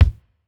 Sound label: japanese pop kick